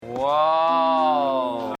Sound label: "woah"